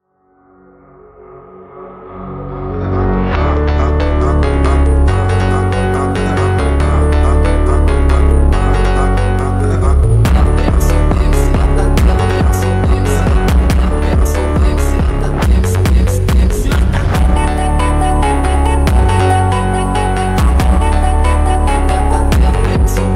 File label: Phonk speed up